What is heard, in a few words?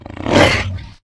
attack; svencoop; tor